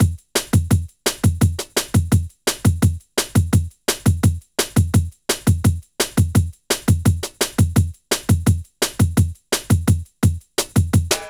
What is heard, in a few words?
Breaks 170, Drum&Bass; EZ Rollers